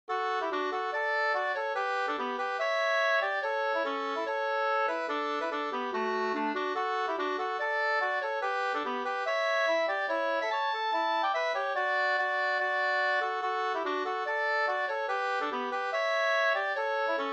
schottis; Harrabuttjen Hj 2st V1

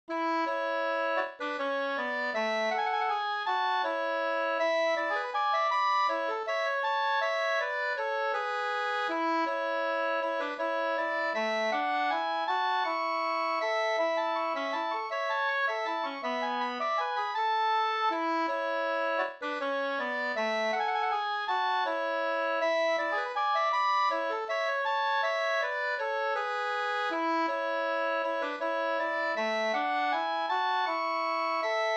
vals; Nils Svensson

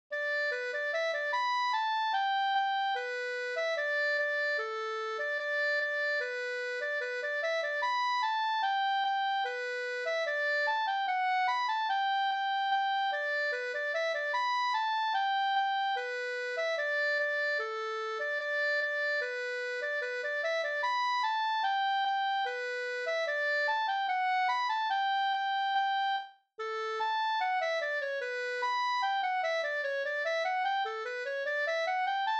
reinlender

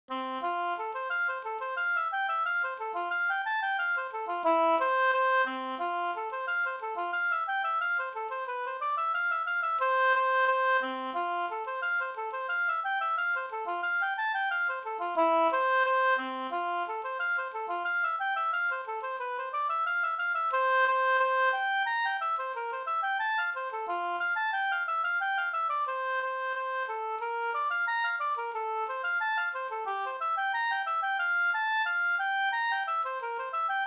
folkdans, ril no; Opdalsril

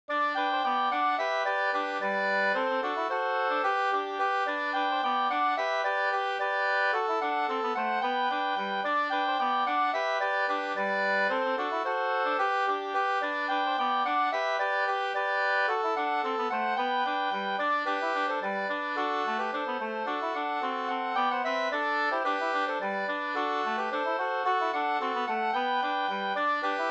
polka polkett; fr Loshult Sk 3st

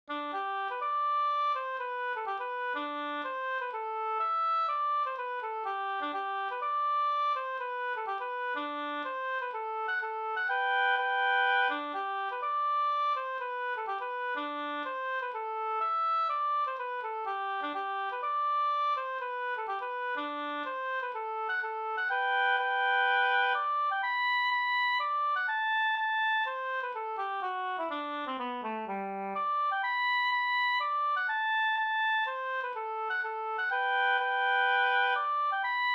polska Bergsjopolska

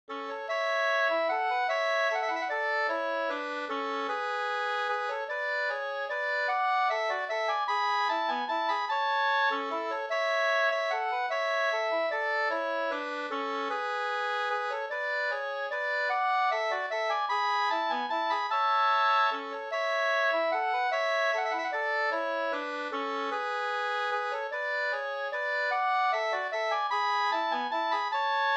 vals

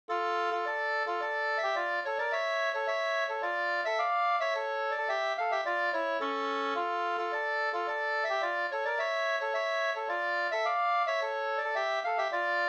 polska Senpolska

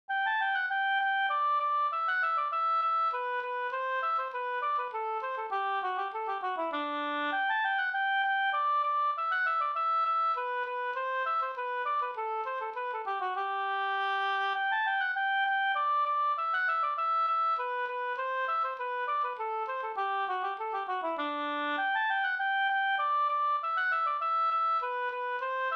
slangpolska

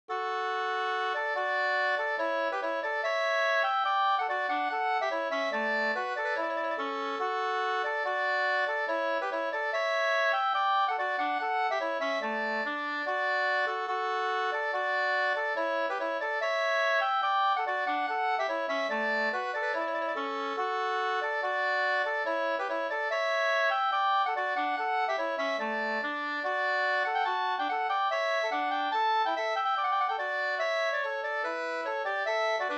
reinlender

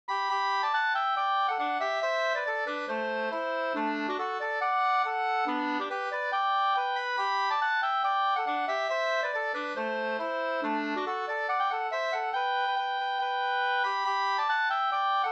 schottis; Trosaskracken Sd 2st

